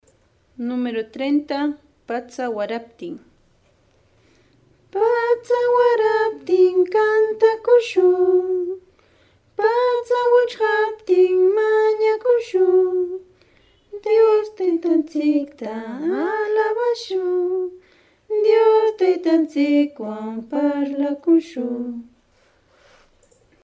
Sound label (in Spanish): cancion